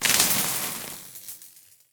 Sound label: hit